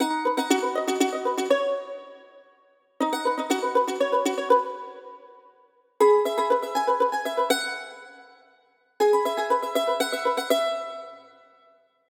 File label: system ringtones; Amazon; Fire-Phone